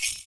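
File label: PERC, percussions; Univers Sons - Raricussions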